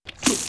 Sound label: Burst